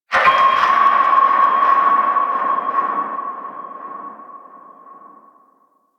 Stadium Infested Medium; Warframe; Ambience; Nineteen Ninety Nine

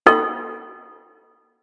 Kid Glass Tap; Ambience; Props; Void Kid; Warframe; The New War; Zariman; Quests

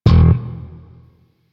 Shawzin Slap Oct One E, Chords; Music; Warframe; Sentient Shawzin

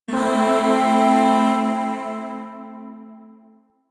Zariman Void Shawzin Maj Chord

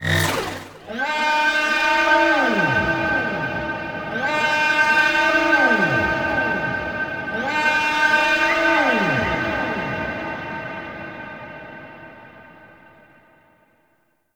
Defense Start Waves; Missions; Orokin

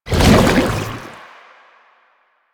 Chem Strike Goo Layer, Footsteps; Enemies; Soldiers; Foley; Warframe; Lasrian; Nox

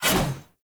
Sword Whoosh Heavy; Weapons; Player; Swords; Garren Sword

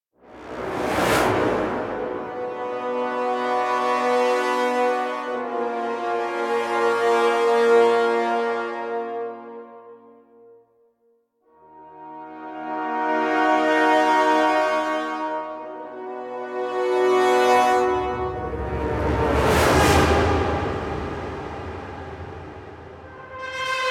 Hvy Blades Brass; Warframe; Dynamic Music; Grineer; Grineer One

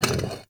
Vase Medium Slide; Objects; Vases

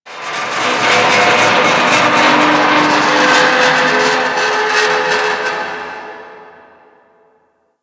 Ocean Long Metal Creak; Warframe; Grineer Ocean

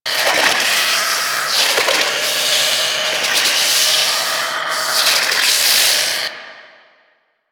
Secondary Barrel Hit Loop, Weapons; Warframe; SU Modular Guns

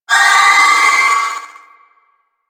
Trooper Attack Long, Vocals; Warframe; DE; Sentients

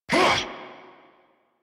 Melee Attack; New War; Erra

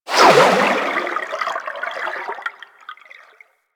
Sharkwing Movement Transition, Vehicles; Warframe

